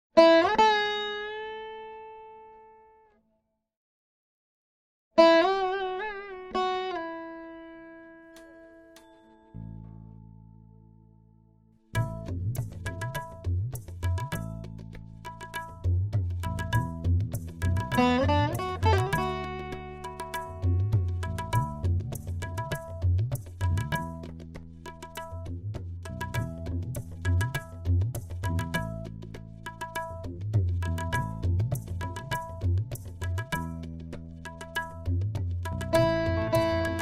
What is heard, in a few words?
Karaoke